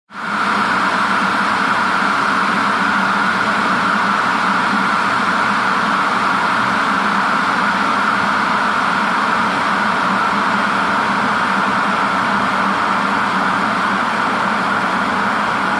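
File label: sound of kettle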